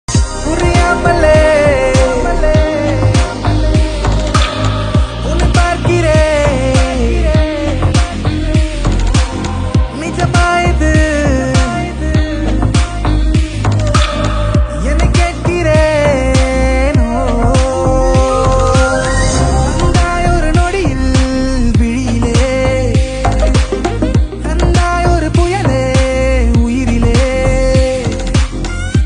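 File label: love ringtone background music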